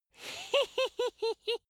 dwarf laugh sneaky soft

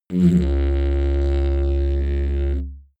didgeridoo short tone

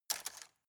cutlery plastic drawer grab

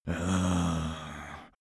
zombie moan idle

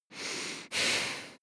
breath female nose in out

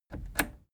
plastic button scratch click disable slow

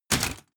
step armor fullplate

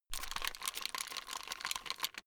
plastic rattle fast long

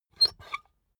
puncher squeak punch empty

